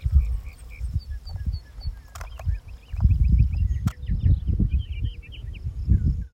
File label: Natural sounds; colorado's landscape